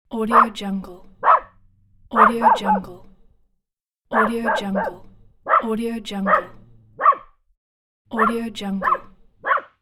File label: dog barks